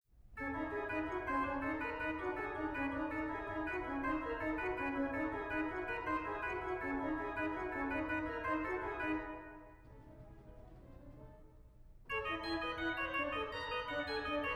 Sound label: Organ; Five Pieces for Organ no3